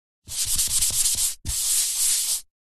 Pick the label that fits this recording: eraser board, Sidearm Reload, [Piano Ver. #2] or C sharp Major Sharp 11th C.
eraser board